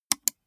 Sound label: gaming mouse left click single